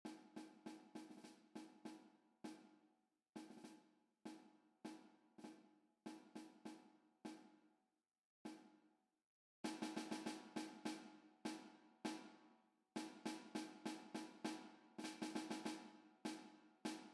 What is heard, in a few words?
snare